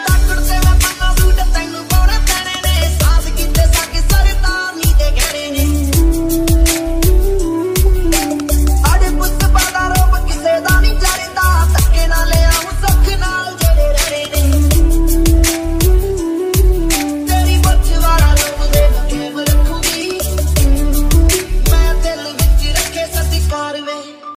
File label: punjabi remix ringtone; bollywood ringtones